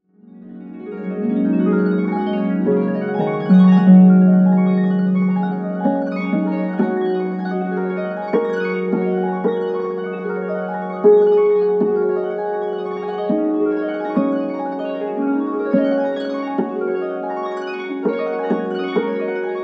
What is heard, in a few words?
Amazing Grace Harp Solo Excerpt